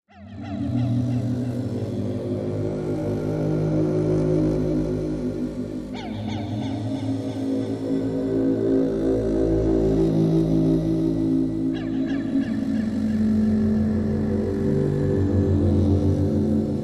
Anti Gravity Swirling, eerie ambience

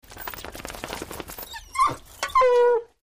Dog Hound Flaps Ears, movement

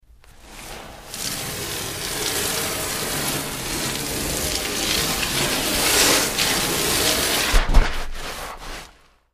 Sand Bag Dragged Scra

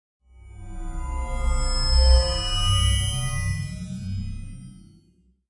Glass HF Glass Reflec

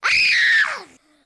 Girl Scream Shortene

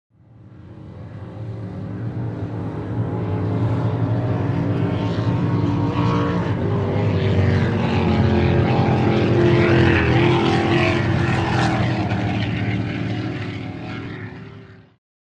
Power Boats By Fast C, off shore power boat

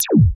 laser; lasers

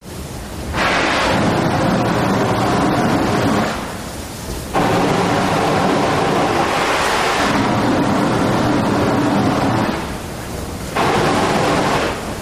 Steam Blow Down Big, steam train

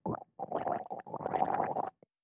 Gargling

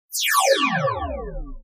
Laser Death Ray With Flange

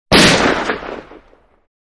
Pistol Or Rifle Shot

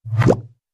Big Wet Goopy Impact; impacts

